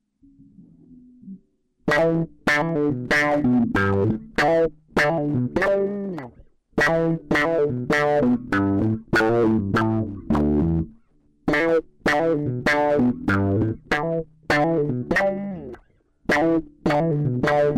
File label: wah; Snarling